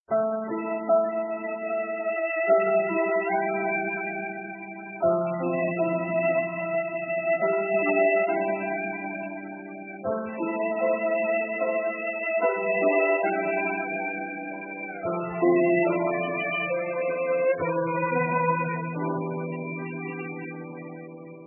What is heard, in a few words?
sad violin sound effect music